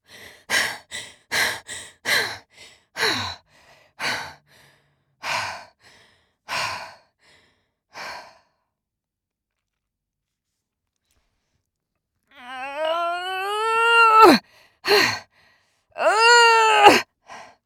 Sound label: Efforts; en-US